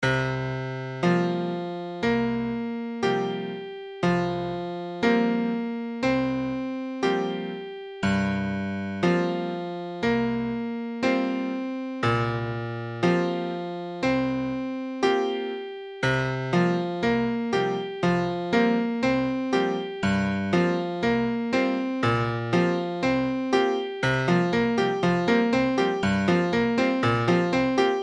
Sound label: C7sus4, guitare